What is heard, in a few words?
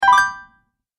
Great Idea, cartoon; Cartoon Sound Design Sound Effects